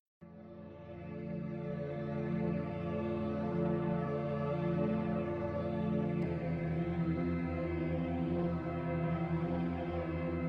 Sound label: wyluzowanie, 432 Hz chillout music; music in action